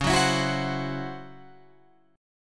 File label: Db Minor Major 7th Double Flat 5th Db Guitar Open F; strummed; DbmM7bb5; JGuitar